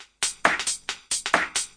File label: 135 tinny house hats clap, drum loops and breaks